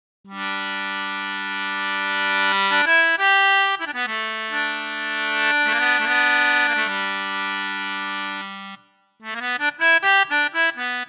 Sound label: just a little jazz